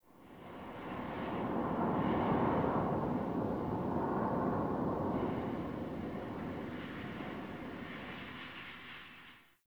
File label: Wind Heavy Slow Gust; Ambience; Dungeons; Under City